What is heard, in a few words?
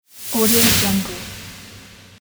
air swoosh